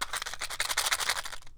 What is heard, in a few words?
Shake Nail Box